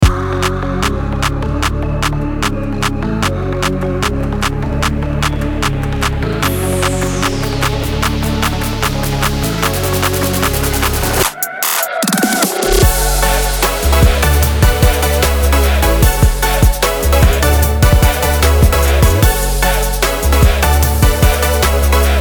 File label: party party dance club music